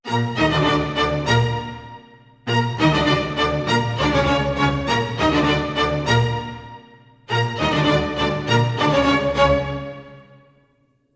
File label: 100 strings, Orchestral arrangements, Gmin; PowerFX - Downtown Orchestra